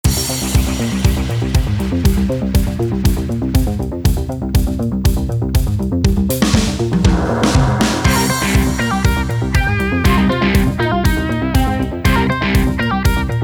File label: Electro Disco